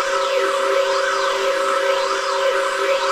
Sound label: loop machine; sci-fi